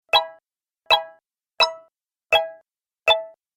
App Menu - Five Sounds, Ping

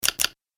Multimedia Double Button; Business Programs, Apps And Computing Noises; Andrew Fleming

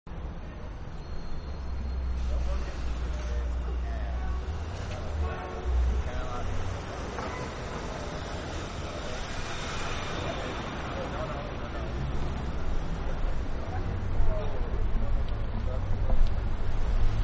Back Street Ambience; Cities (Sound Effects:Backgrounds); Manhattan Production Music

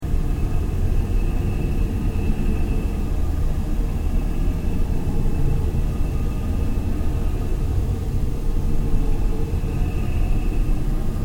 Ambient Drone No Sweep; Sound effects created by manipulating other sounds; Drones (Sound Effects:Sound Design)

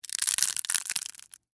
Crunch Short Crack, Wood, Basket; Texture; Crunches; BlastWave

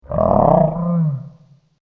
Mythical Beast The Minotaur; Monster Sound Effects; fantasy sound effect

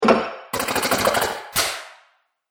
Robot Factory Noises; Robot Sound Effects

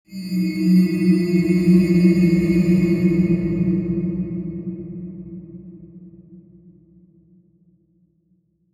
SWELL REVERB, Swell Reverb Digital; Production Element Imaging Element Accent Transition

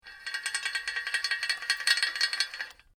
Metal Chain Pulley Rattling Chains; Chain sounds

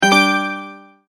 multimedia tone start

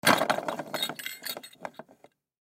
Baby Toy Chair; Baby Chair Hit Impact Spin Plastic Piece; Miscellaneous Cartoon; Household; Blastwave

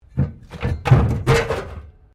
WRECK METAL ALTERNATER MOVE, Wrecked Cars; Wrecked Cars, impacts, crashes, scrapes; Crashes (Sound Effects:Objects)

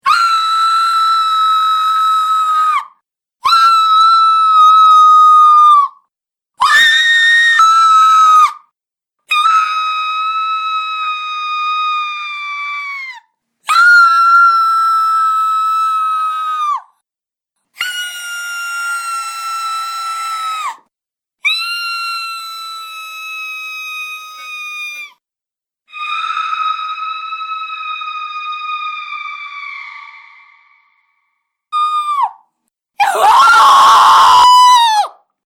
Scream Human Female Soundpack; Screaming Sound Effects

